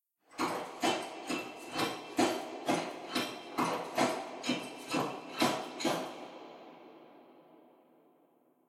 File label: walk sideshuffle